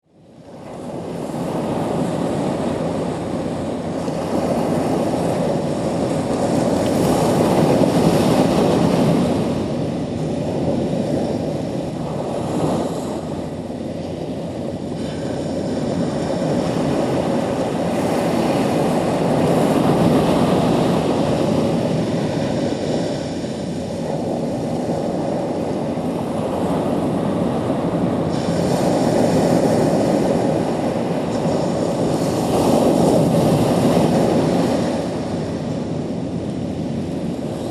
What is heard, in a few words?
ambient waves